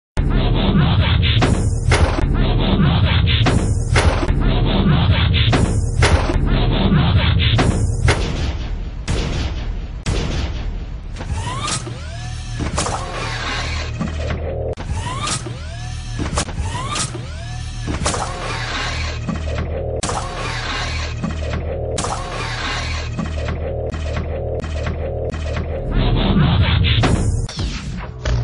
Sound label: Transforming